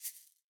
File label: shaker single hit; Raw Perc One Shots; Slate; SD SEISMIC Drum Sample Pack